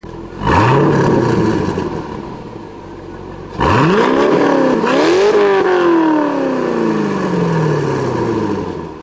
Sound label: c5 pace exterior revs, exhaust systems; corsa